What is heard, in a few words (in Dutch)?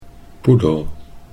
poedel